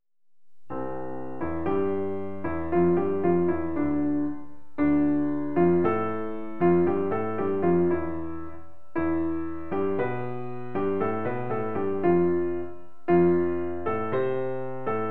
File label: Keyboard Pattern